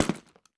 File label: boot, player steps; quake2